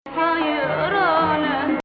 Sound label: Hijaz, maqam